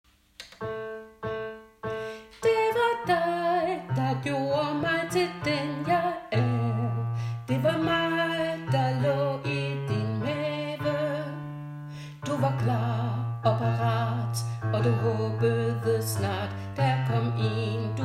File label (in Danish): dine øjne bas med sang